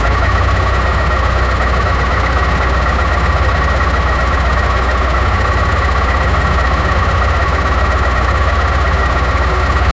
motorsports

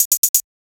chant one shot, beats; chant one shot sound make

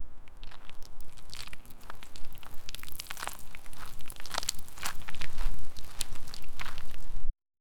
gently stepping into very, footsounds

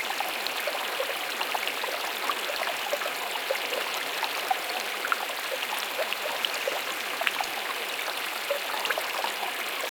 soft creek sound

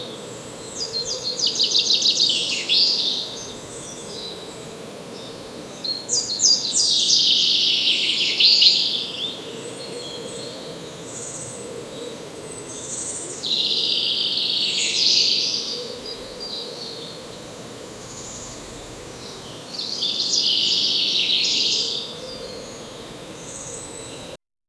sounds of the spring fore

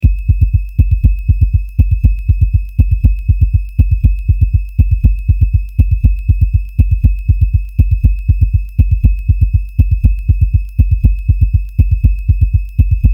soft jungle ambience with, bird calls

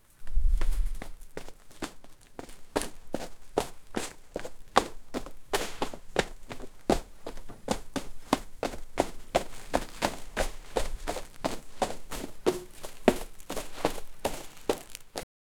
sounds of skeleton runnin

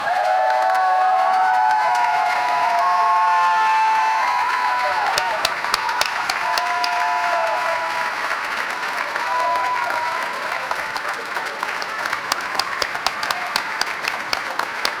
the sounds of young peopl, events

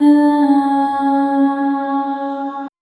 synth like vocal calm fem; childlike

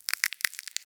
resource completely harvest

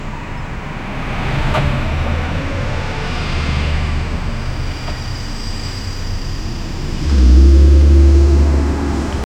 busy traffic jam ambience

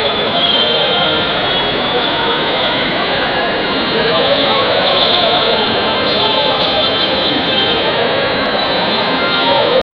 mall ambiance, retail

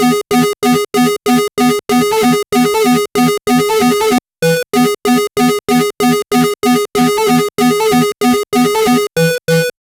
gameboy fight song in 8-bit

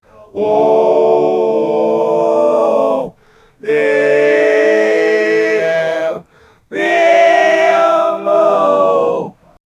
the folk chanting for the

